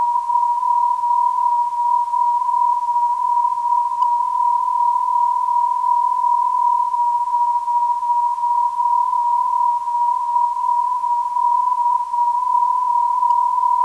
WUN 419kHz, ndb

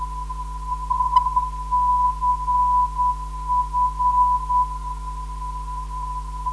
347kHz, ndb

